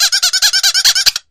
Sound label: Rubber Toy Squeaks, toy squeak